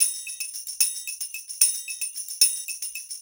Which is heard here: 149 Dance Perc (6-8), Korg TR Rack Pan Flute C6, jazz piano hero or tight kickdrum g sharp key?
149 Dance Perc (6-8)